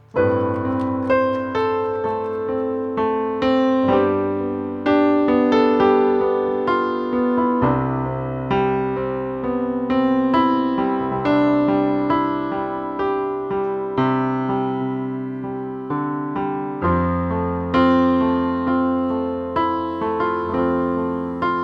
Unknown Piano, San Francisco International Airport